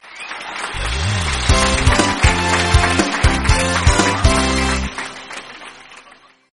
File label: Win